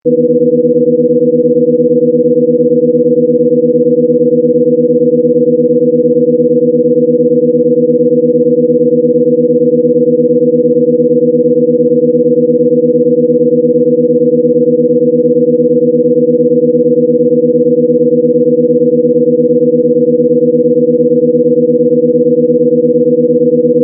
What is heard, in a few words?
briefing room; background; tos